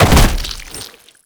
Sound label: Land Heavy Goo, movement; Warframe; Uriel